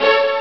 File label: D50 arco; ST-XX Amiga Soundtracker Sample Packs